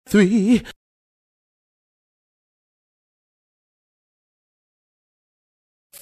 filmmusik

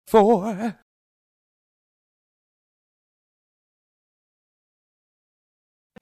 filmmusik

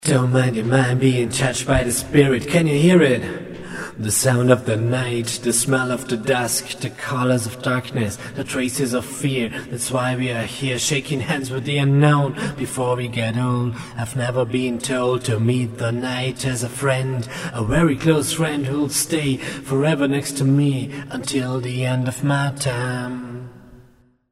gesangstrophe

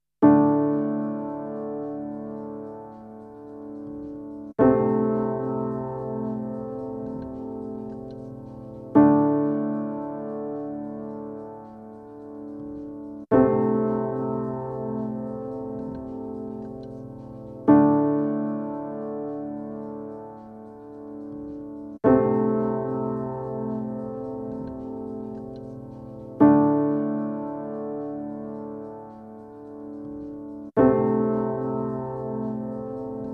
klavier

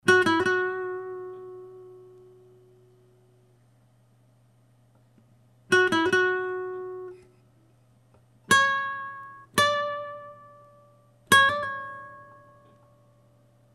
sologitarre

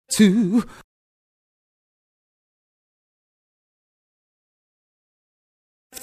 filmmusik

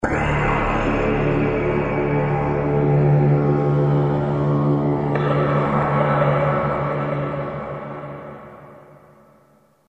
plastic schwebe ton

